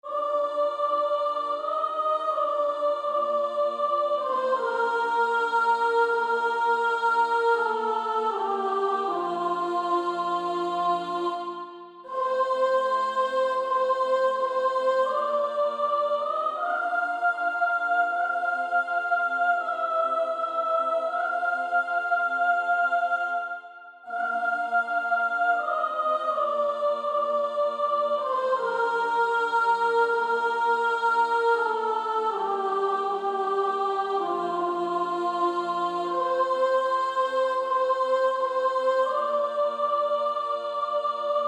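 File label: Female Choir, soprano 2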